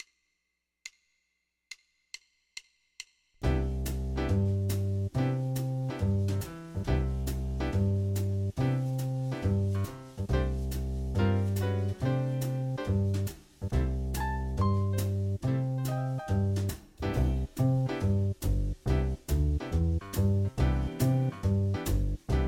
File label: Cmaj7 1 Chord Groove; guitar; guitar lessons